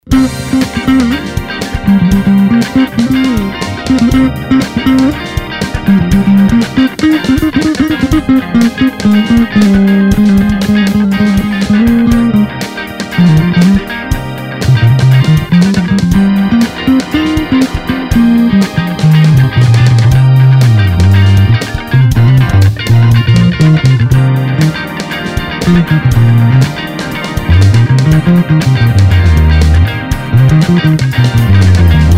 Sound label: Funk in C Solo Bass; Blues Solos